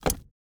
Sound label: submenu corridor open